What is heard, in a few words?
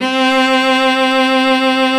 Noises Cello; Production Master; Vital Future Bass 4